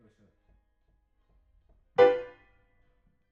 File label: PIANO; TANGO